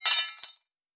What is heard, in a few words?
Weapon UI; Fantasy Interface; Fantasy Sounds Bundle